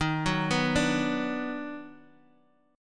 Eb Minor Major 7th Sharp 5th, down, Guitar, Half Step Down